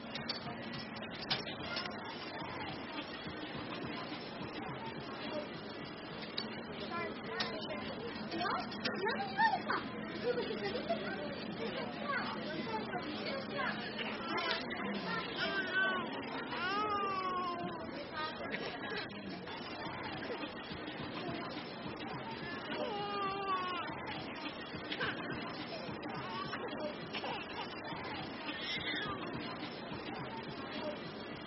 Amb Mall Babyshop

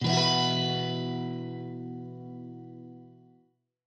B sus4

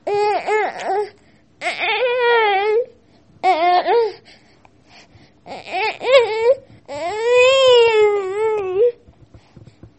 Baby Grizzle

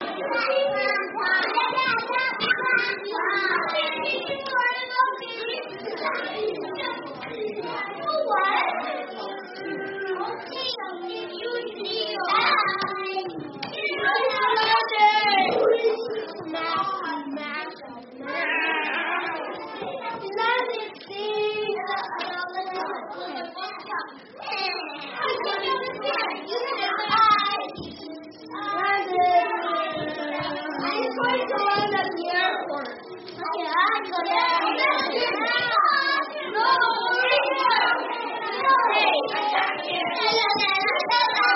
Nursery Kids Playing Indoors

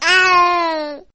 Nursery Baby Awake

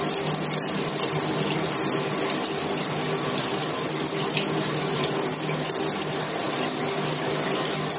Amb Shower

